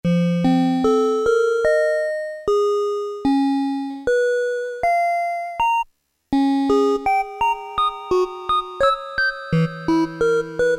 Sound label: celesta, Casio MT-68; preset sounds